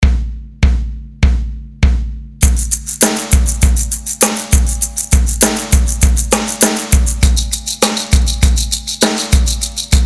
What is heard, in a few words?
shakers; ap shakers; audio perception music